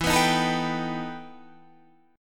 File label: E Minor Major 11th E Guitar Standard; EmM11 strummed; 12,14,13,14,12,15